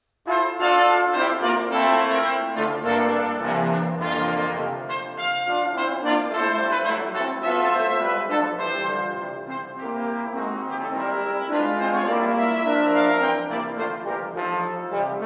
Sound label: Brass Quartett Allegro